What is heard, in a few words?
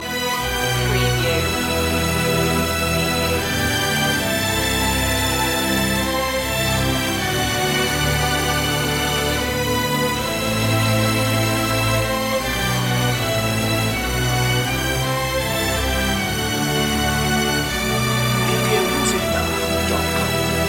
strings only